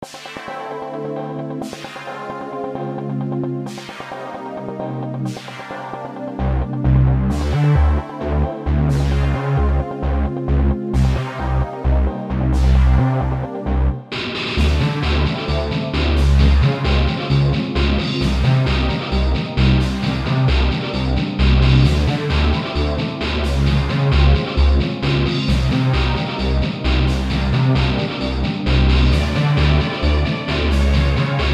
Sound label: synth